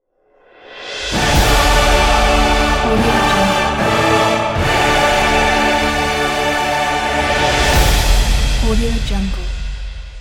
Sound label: orchestral choir logo